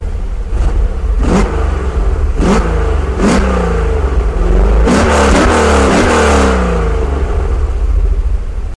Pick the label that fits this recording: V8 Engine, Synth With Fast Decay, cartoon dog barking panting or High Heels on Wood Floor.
V8 Engine